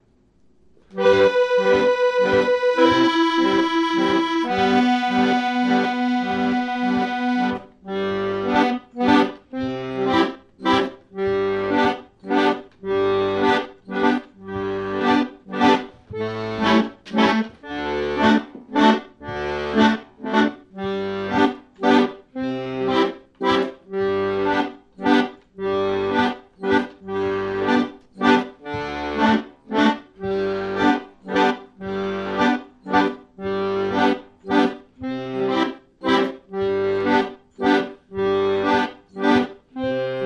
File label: blues backing track in G